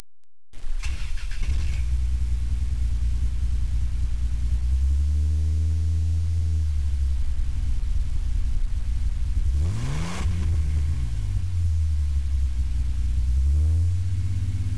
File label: exhaust